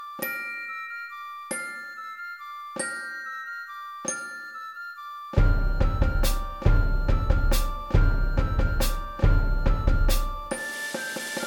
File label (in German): Schlagzeug [140]; Carol of the Bells